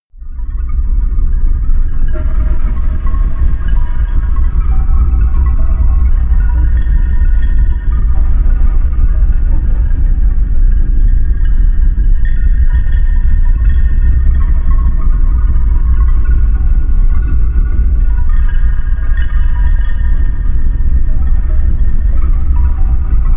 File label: bell rumble